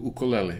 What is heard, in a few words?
ukulele